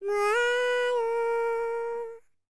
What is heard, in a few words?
HIMALAYAN, World Voices; ILIO; Vocal Planet VOL-5